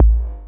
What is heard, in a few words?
roomy; Pro-Rec - Synthsonic